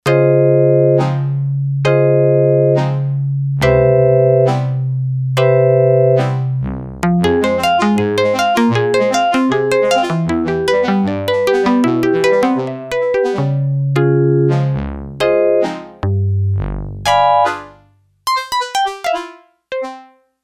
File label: Octi Late, Yamaha TX802; Factory Patches; Voices